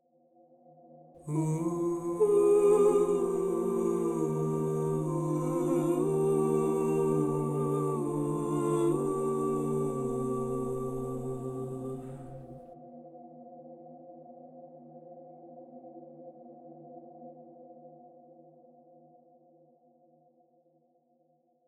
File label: Dissolve; Mixolumia; Dissolve Parta